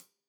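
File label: hat closed, hi-hat cymbals stereo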